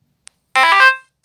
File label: Chord high